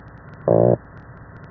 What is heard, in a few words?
Argyrosomus regius Intermediate Grunt